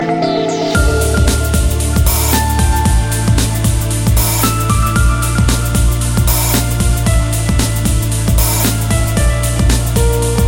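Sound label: tehno mix, techno mix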